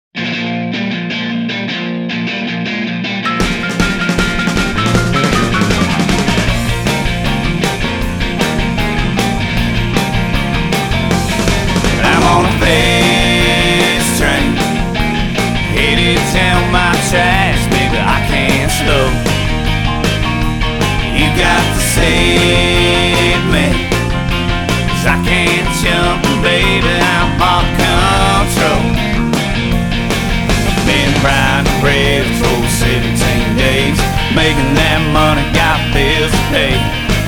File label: Fast Train; Firehorse